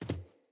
Sandals Land Dirt, Footsteps; Player

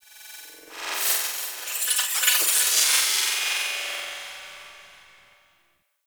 Tech Texture Small, Ambience; Warframe; Cephalon Weave

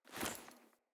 Foley Walk Default, Default Clothing

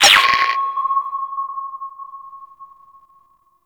Order Ping; Gameplay; Kahl; Warframe; Veilbreaker; Devourer Kahl

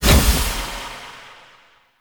Guardian Shell Break; Warframe; Focus; Vazarin

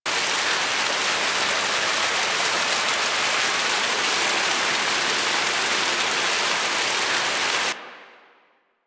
Small Water Fall Loop; Ambience; Props; Warframe; Nineteen Ninety Nine

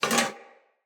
Foley Armour; Enemy; Mockeries

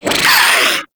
Swarm Vocal, Enemies

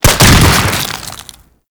Infested Hive Explosion; Gameplay; Warframe; Corpus Infested

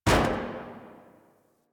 Parking Lot Car Door; Warframe; Ambience; Nineteen Ninety Nine

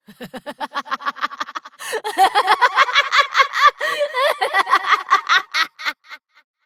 Laughter; Entrati Doppelganger; Evil Twin D; Battle Barks; Warframe

